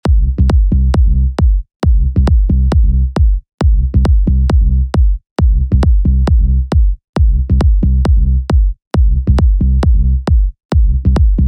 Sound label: loop; GIMMICK; C minor